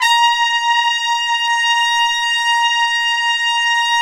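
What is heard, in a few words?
BRS, Brass Trumpets Section 3 f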